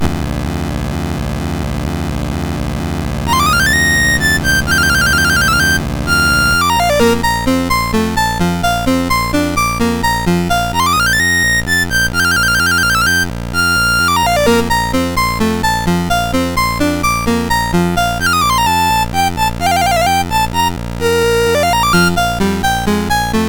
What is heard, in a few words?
Chinese Dance, MOS6581R2